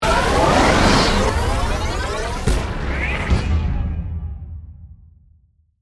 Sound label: Stinger Crescendo, Production, Element, Stinger, Crescendo, Hits; Stingers; BlastWave